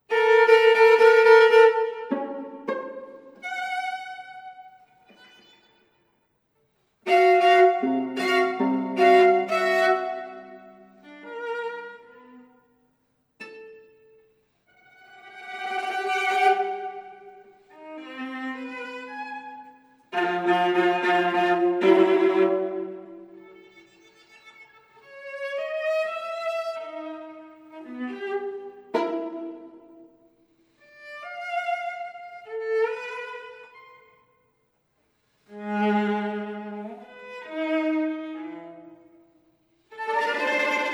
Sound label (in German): Improvisation f Viola Ausschnitt